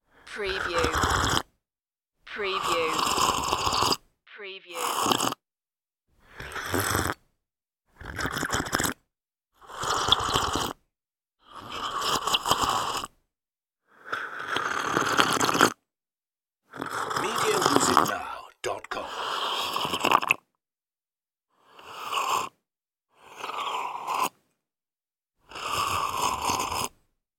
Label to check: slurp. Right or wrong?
Right